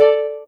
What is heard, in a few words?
soft hitwhistle; osu